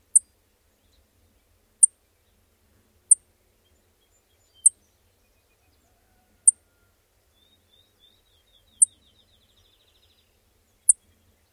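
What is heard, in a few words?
Henslow's Sparrow, chips; NY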